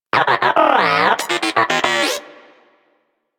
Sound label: Moa Inquisitive Vocal Agree; Warframe